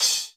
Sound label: DRY CY SPLS, drum; Crypton; DrumBase5000